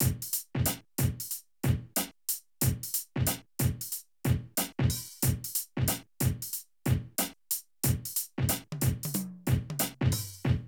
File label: Drum loops, Streetsoul